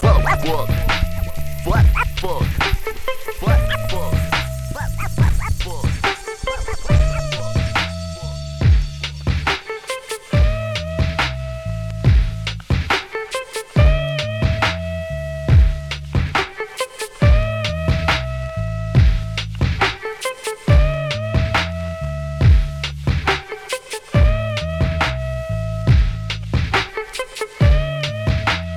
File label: Instrumental